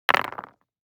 DICE on Neoprene, Throw and Roll, Mini, 6 Six Dice; Samples; ArticulatedSounds; DICE Sfx Party (Extended)